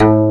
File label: GAYAGUM A#2, KOREAN INST